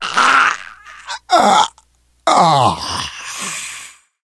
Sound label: death scream, survivor voice; source engine; left 4 dead 2; manager